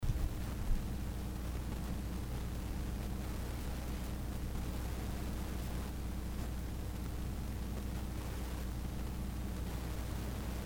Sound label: static